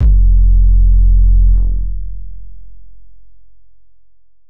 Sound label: Dusty 808 F, hip-hop drum kit